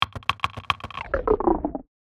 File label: clip clop